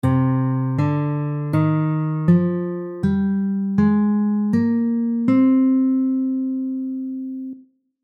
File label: scale, guitar